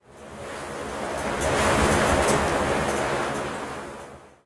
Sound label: wind gust; Source Engine; tf